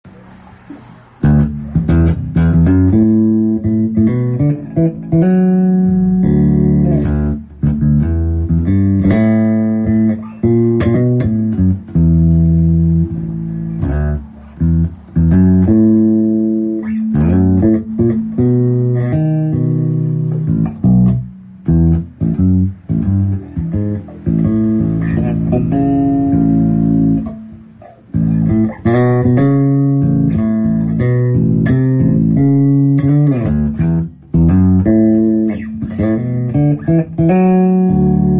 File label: gospel jazz bass riff